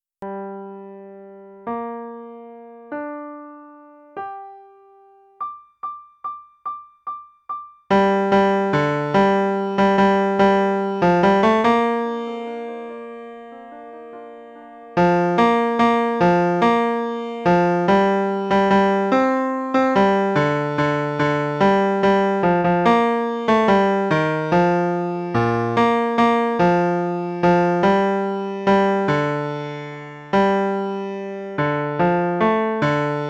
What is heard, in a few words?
Bass